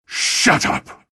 attack; cstrike; wcs go

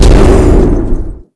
absorb

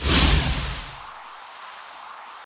frost bolt

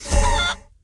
sheep

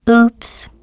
fail; cstrike; timer sounds; glados pack

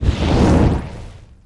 firecast

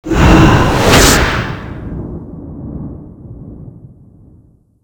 rune tap; csgo; wcs go

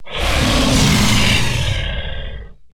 rage; csgo; wcs go

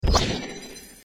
timer pause

